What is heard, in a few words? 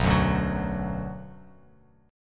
B Minor B Bass Standard; strummed; JGuitar